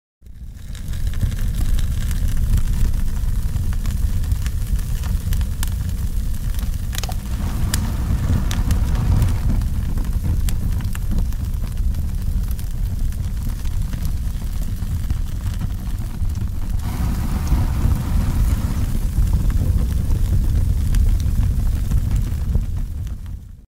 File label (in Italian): Effetto sonoro FUOCO THE FIRE; riconosci l'oggetto dal suono